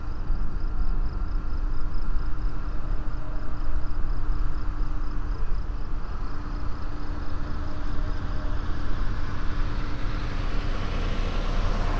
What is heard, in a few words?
engine